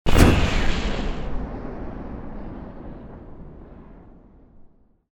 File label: explosion